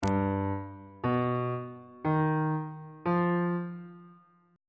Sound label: g augmented 7th chord intervals